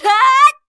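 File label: MON ATTACK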